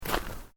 Footsteps Boots Road Gravel Step

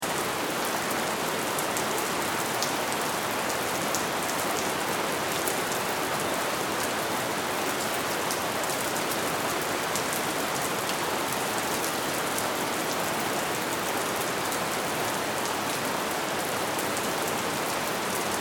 Weather Rain Storm Ambience Loop; SFX Bible

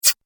Short Fast Whoosh; Whoosh Sound Effects

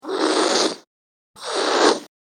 Cat Hiss Mad; Cats (Sound Effects:Animals)

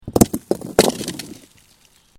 Bricks Movement Minor

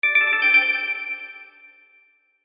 Chime Musical, Chime Musical Multimedia

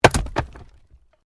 Wood Chopping Axe; Chopping Sound Effects; Objects

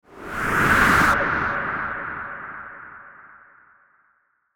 Whoosh Distortion Wild Cat Growl; Blastwave

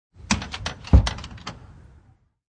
Front door is shut; Miscellaneous Doors

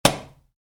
Spray can lid off, Spray Can: Remove Lid; Spraying Sound Effects; Spraying (Sound Effects:Objects); Stuart Duffield

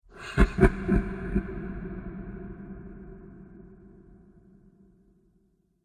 Deep Monster Laughter; Monster Sound Effects; Andrew Fleming

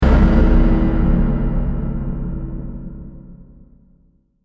Hit Metal Gong Bell Deep; Production Element; Sound Design

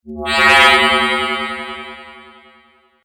Electronic, Pulse, Ray, Scifi; 5.1; Sound Effects:Science Fiction; BlastWave

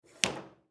Foosball Table; Foosball Table Player Goalie Spin; Games

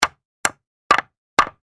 Industry Hammer Nail Wood Multiple; Hammers; Tools

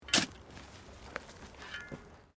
Digging Shovel Sand; Various digging tools and processes; Construction Sound Effects; Construction (Sound Effects:Backgrounds)

